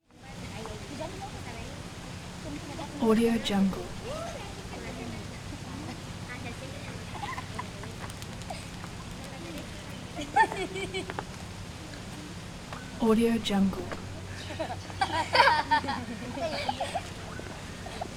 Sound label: mexican kids play exterior courtyard ambience; prosoundfx